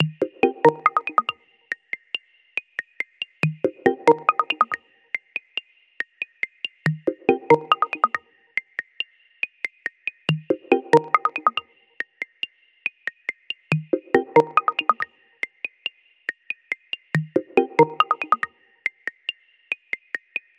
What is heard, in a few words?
baobab ringtone